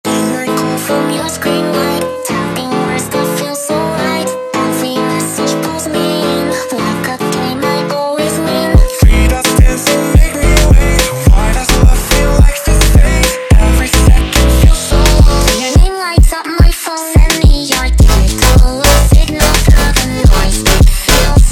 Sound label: super Slowed, funk